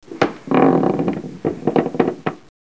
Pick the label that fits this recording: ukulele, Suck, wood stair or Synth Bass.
wood stair